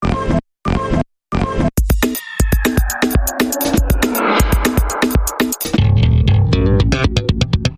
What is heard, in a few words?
Eclectic Percussion Bumper